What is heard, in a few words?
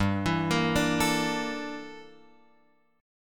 G Minor 6th Add 9th G down Guitar Open F; Gm6add9 arpeggiated; JGuitar